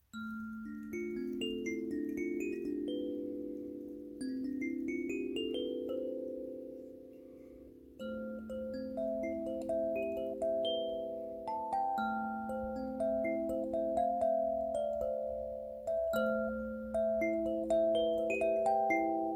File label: 17tone Birdseye ASH Aminor Kalimbas; Eschenholz; Hikari special; A-Moll